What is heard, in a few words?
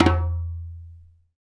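djembe, African Perc; Spectrasonics; Supreme Beats; African Contemporary; SB Afro DRMs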